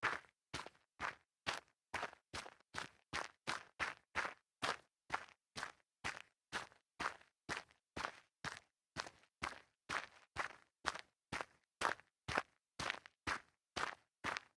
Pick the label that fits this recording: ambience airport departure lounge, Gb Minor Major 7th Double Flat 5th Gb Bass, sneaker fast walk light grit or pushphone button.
sneaker fast walk light grit